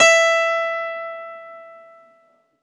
ZITHER, Alpine Volksmusik

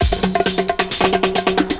PERCS, 70 s Breakbeats

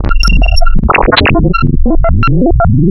FMAM Sine, wavetables, Hybrid Modulation; Architecture 32

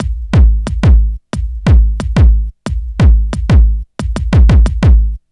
Kick Loop, Drum & Bass; Construction Kit

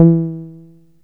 SOFT E3; Best Service Alpha Dance vol.2; SOFT SEQ.2

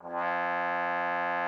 TROMBONE, F2; Xsample VOL-3

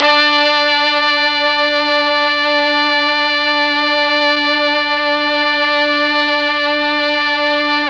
D3 brass, Mellotron MKII; Mike Pinder - Mellotron Archive CD

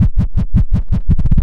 Chugger, Synth Sequences

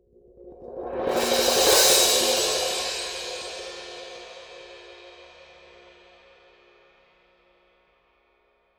Cym crescendo-decres, Cymbals, Orchestra; 90 s Sample CDs; PowerFX - Downtown Orchestra

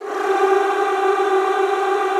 G3-R, Extended Classical Choir; Best Service

